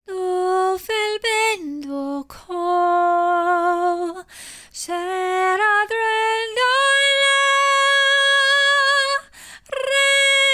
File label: Phantom Sing